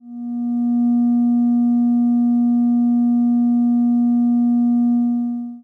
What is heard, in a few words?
Sine wave